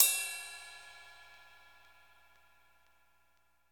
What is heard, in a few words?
ride 2 bell, interactive drum kits